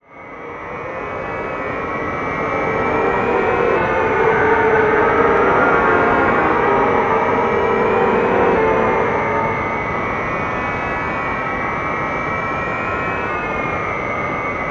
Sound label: SIGNAL, RADIOINSPACE; Zero G; Malice In Wonderland